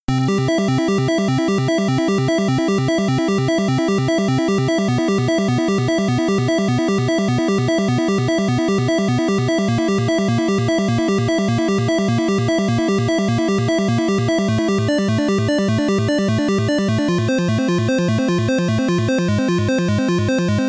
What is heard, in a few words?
playing around with slight pitch offsets; weeklybeats-2020